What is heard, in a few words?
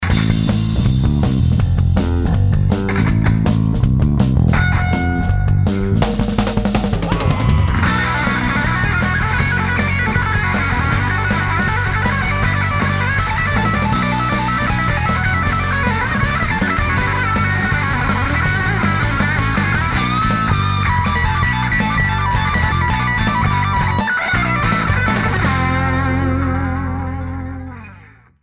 rock